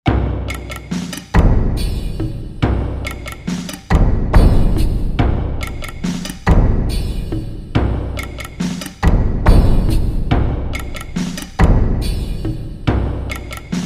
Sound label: China Silk Percussion